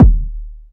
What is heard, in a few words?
dope kick drum sound d sharp key; kick drum samples